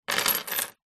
household jewelry necklace silver charms set down wood table; zapsplat